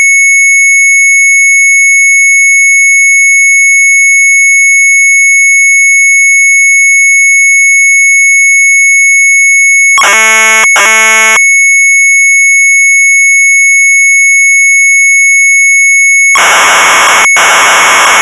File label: MZ800 Tape; sharp; retromelsarchive